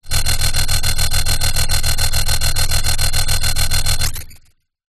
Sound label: science fiction spacecraft tractor beam short; zapsplat